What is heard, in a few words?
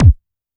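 Plastic Bass Drum, Drumset; Roland SH-32; Drumset 03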